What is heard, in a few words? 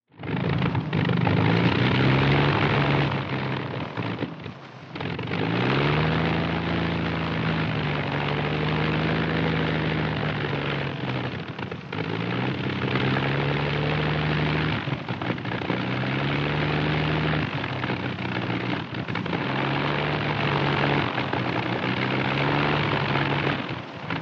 AIRCRAFT PROP ANTIQUE SINGLE ENGINE: INT: Spluttering engine.; ww2 fighters